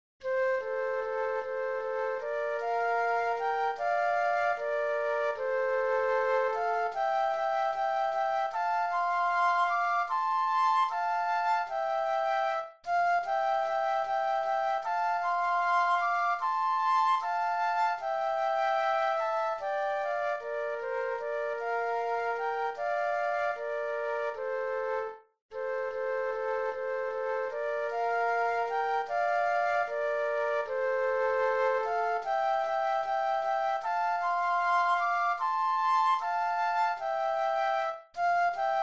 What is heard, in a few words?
Flute Duet